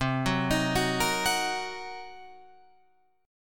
C Minor 7th Flat 5th C down Guitar Open F; Cm7b5 arpeggiated; JGuitar

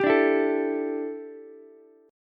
D Suspended 2nd Suspended 4th D down Ukulele; strummed

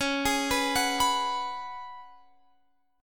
Db 7th Suspended 4th Db down Guitar Drop D

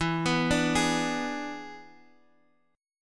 E 7th E down Guitar Half Step Down

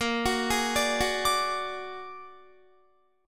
Bb Augmented 7th Bb down Guitar 4E,4A,5D,5G,5C,6F; Bb+7; arpeggiated

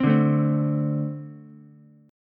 A-Minor Double Flat 5th A Ukulele; strummed; JGuitar

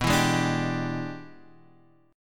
E 7th Sharp 9th B, chord

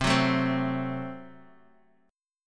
Gb Diminished

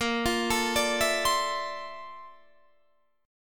A sharp Major Sharp 11th, Guitar, DADGAD

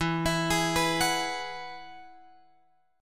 E Major Add 9th

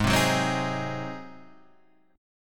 Ab 7th Ab down; Ab7 strummed; JGuitar

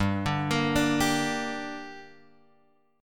G Minor G, G Minor chord; Gm Chord {3 X 0 3 3 3} arpeggiated

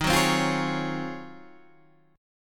Ab 13th Eb

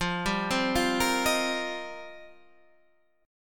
F 11th Suspended 2nd; 112:1,2,(5),b7,(9),11

